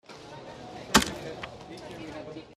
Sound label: Stamp Ticket Passpor, airport